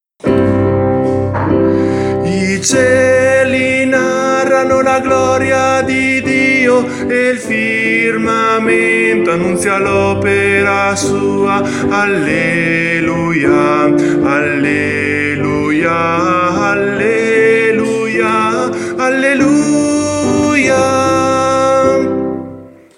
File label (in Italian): TENORI